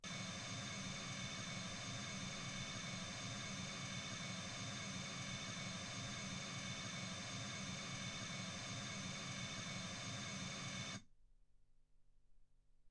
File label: mls 48kHz